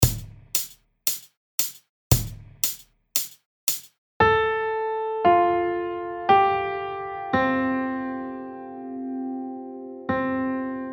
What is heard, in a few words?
bells